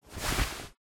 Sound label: Clothes